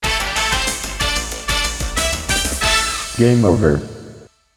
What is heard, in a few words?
Game Over